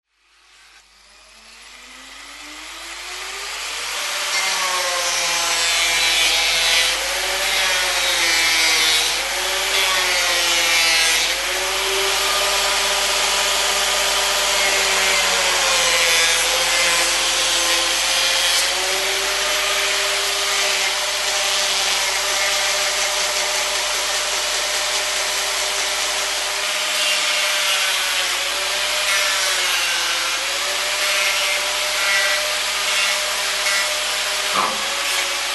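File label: sounds of angle grinder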